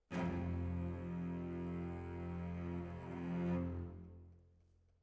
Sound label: Decca, Jazz for piano